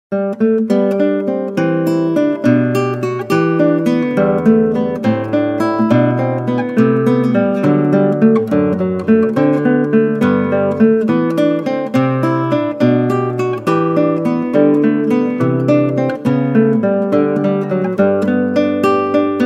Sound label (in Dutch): Klassieke Gitaar; Bach 50 Solos